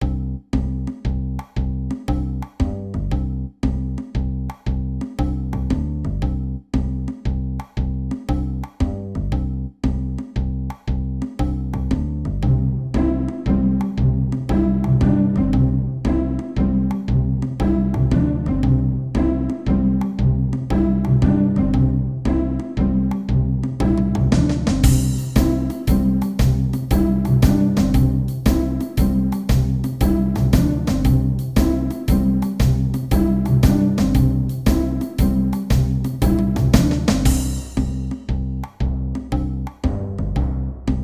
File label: Bolero